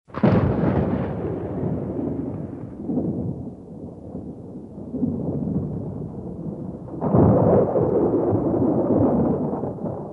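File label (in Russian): два раскатистых удара грома; звук грома; Сильный гром без дождя, гром с раскатами и одиночные удары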